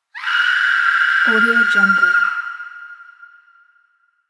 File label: woman scream